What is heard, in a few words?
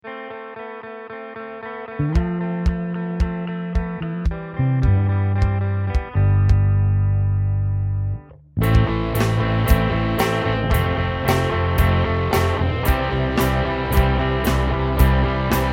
4/4 rock garageband